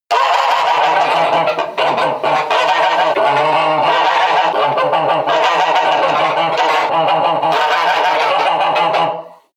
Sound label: geese quack